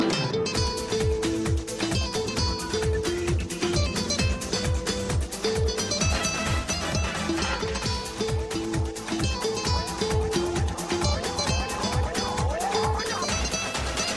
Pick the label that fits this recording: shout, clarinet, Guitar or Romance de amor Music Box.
Guitar